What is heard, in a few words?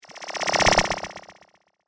Twisted Theremin; Miscellaneous Cartoon Sound Effects